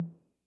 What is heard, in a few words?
Tom Hi Min Decay, 808